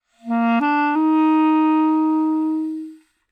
clarinet phrase Bbmaj 100bpm; bespoke - Floral v.2

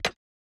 random perc; drums; perc oneshots; bespoke - Floral v.2

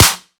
snare; 7rystan's Snare Pack 2018

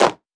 clap; bespoke - Floral v.1; drum oneshots

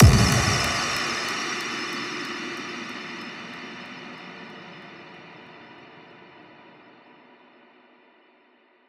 Impact; 7rystan's 600+ FREE EDM SAMPLES & DRUMS; SFX

